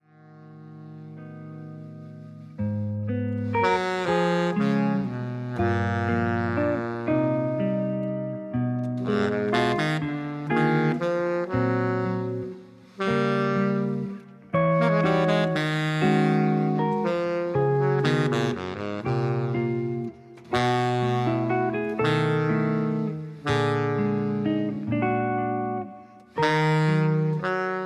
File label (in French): musique de film; l hypothetique